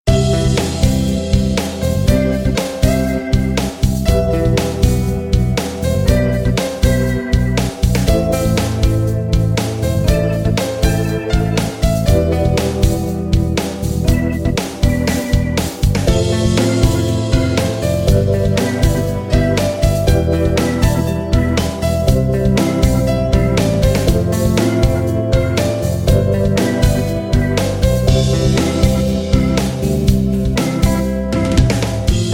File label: background music